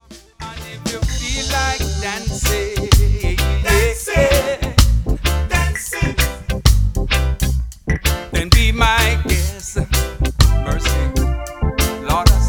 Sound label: Cool Reggae Rhythm